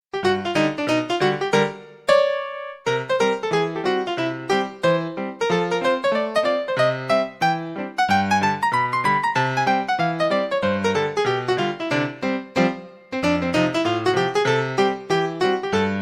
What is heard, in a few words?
ragtime